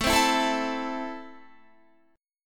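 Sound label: A Minor, Guitar, chord strum; 17,15,14,14,16,16; strummed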